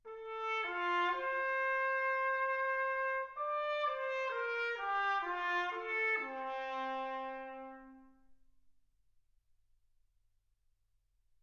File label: trumpet